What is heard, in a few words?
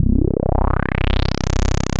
BOOYA YA, bass